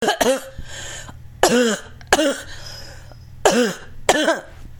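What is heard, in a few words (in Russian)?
сухой непродуктивный кашель вызванный тяжелым случаем covid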